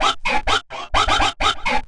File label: Spinz Loop